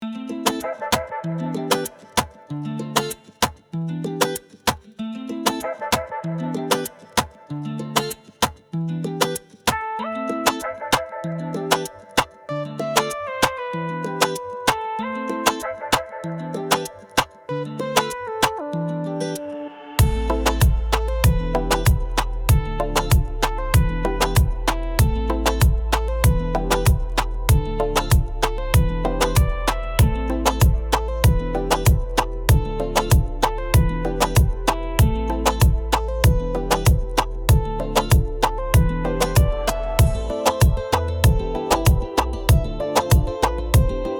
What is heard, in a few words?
Reggaeton beat sunset cadera